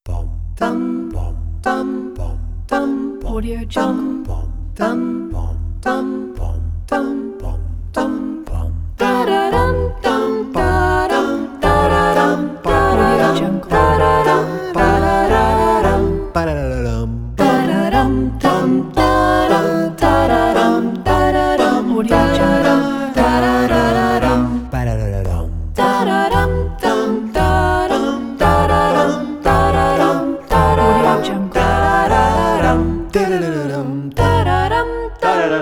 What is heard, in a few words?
vocal quintet; dance of the sugar plum fairy